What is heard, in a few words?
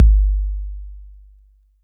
kicks heartbeats